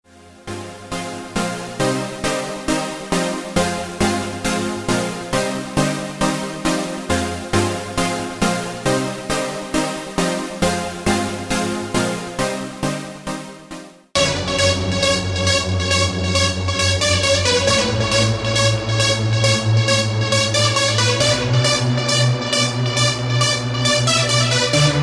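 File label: dirty south virus, synth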